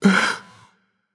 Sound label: breath; smite; ares